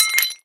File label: pottery impact; physics